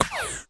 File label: Button Overview Hide